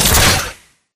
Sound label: Mvmt Servo Jump; AI Stalker; Titanfall 2